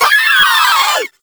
Volatile Runner Kamikaze Scream; Warframe; Proto Infested; Abilities

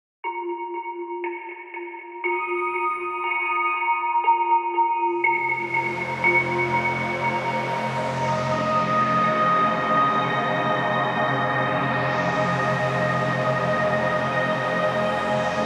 Ambient Random; Railjack; Ambient One Offs

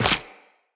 Run Goo, Footsteps; Tenno

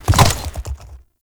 Enemy Horse Stomp; Duviri

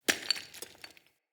Amb Ruin Stone Crumble; Ambience; Mendicant Area

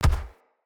Step Default, Footsteps; Enemy; Corrupted Sproutfolk

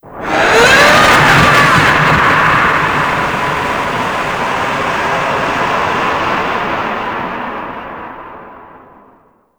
Barrage Start; Warframe; Berserker

